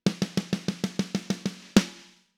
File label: Snare Comp